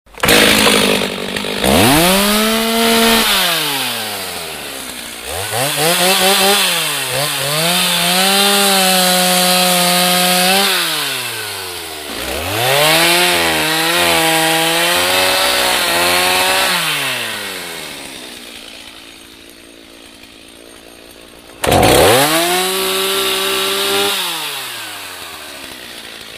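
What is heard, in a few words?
sound of a chainsaw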